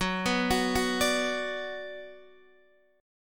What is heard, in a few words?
Gb Suspended 4th Sharp 5th, Guitar, Open D; down; chord shape